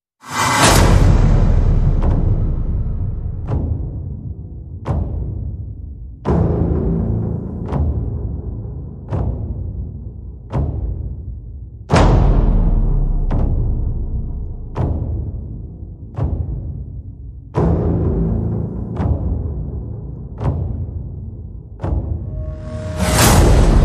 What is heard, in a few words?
Percussion Pads and Pulses